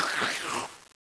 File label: zombi bomb idle; cs16; cstrike